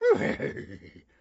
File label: horse exclaim